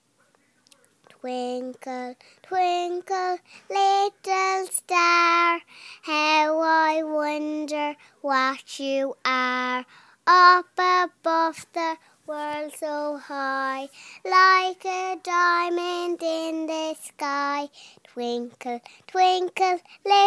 twinkle twinkle age 4

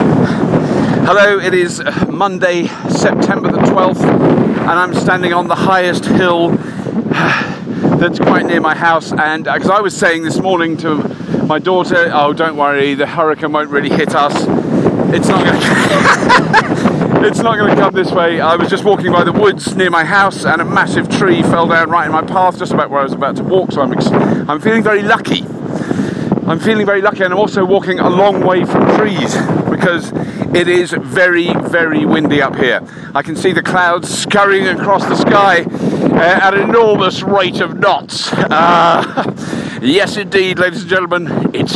windy

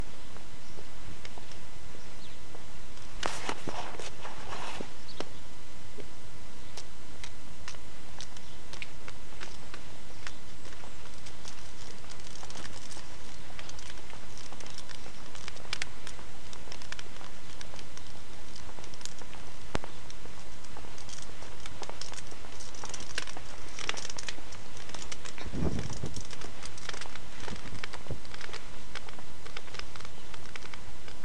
cold and frosty morning walking and birdsong

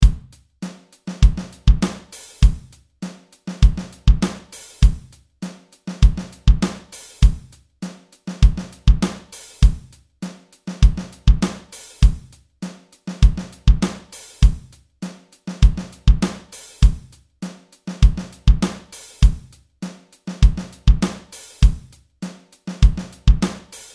8th note drum groove

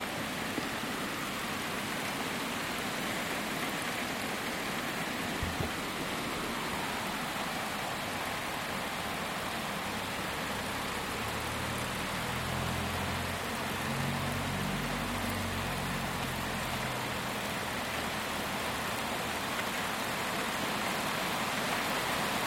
thunderstorm from the caravan; rmston holiday july 2014; barmstonholiday2014

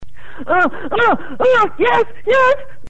orgasm ringtone

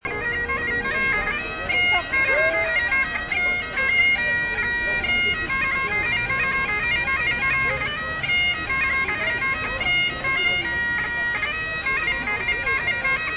bagpipes

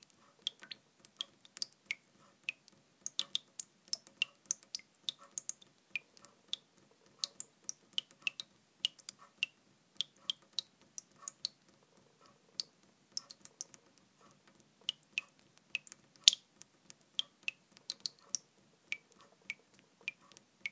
drip

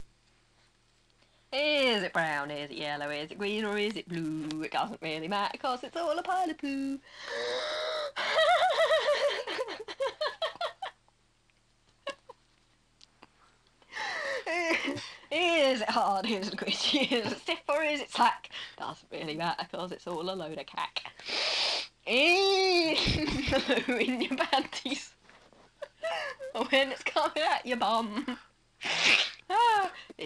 singing the poo song

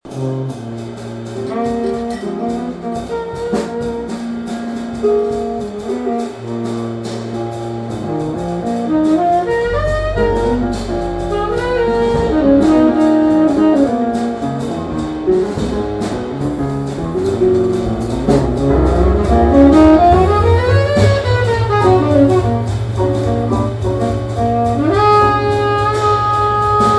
jazz with sax

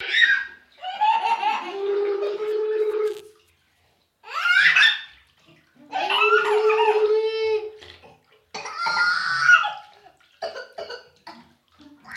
end in burbles

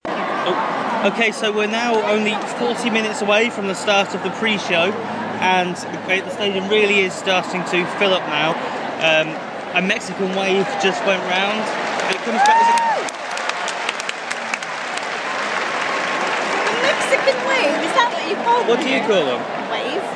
mexican waves in the stadium

